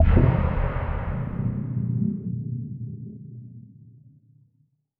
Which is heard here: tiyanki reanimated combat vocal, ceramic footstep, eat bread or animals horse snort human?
tiyanki reanimated combat vocal